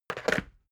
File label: water boiler put down